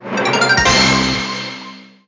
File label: (Jingle) Trade Complete!; market crashers 3ds gamerip 2016